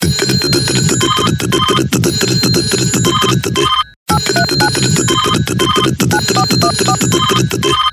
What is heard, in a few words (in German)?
Tin Tidin klingelton